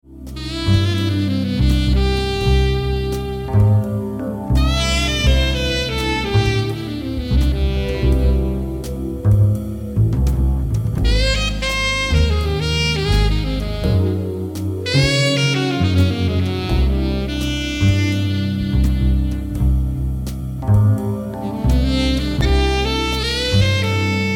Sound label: Song Alto; Dukoff D8